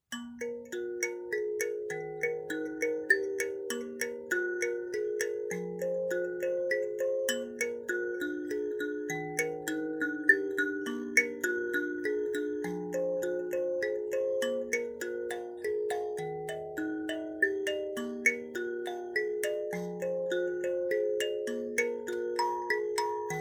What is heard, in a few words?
chemu, mbira